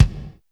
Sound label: kicks